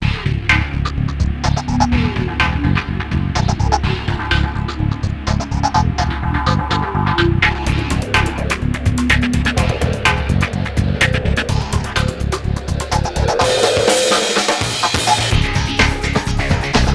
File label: soccer pause music; Soccer Slam; GC, PS2, Xbox